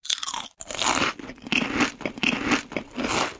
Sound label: eat chips; Original TK Sounds